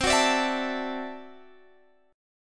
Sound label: D-Major Add 9th C down Guitar Standard; strummed; JGuitar